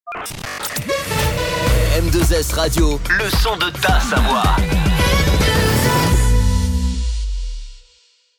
Jingle Chante; M2S

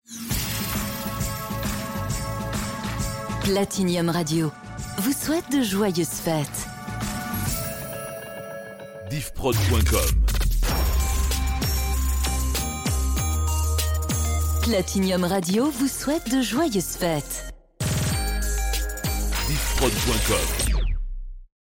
Radio Jingles Noel; Platinium

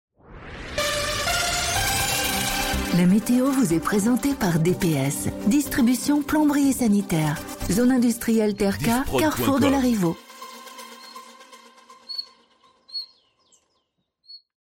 Jingle Meteo; Mo News; DPS

